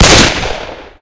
pistol fire; weapons; gunfire; source engine; left4dead2

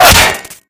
bullet impact bot

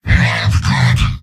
heavy negative vocalization; mvm

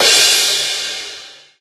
hitsound percussion

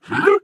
ballbot confusion

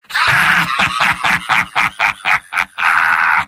laugh long; soldier; video games; source engine; tf; mvm

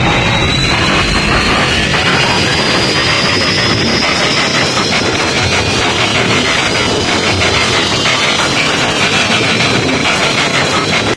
attack helicopter crashing loop; aheli crashing loop

